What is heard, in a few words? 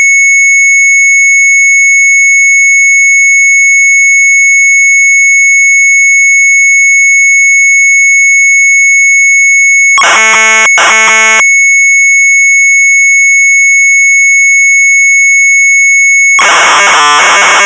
Sharp MZ800 Tape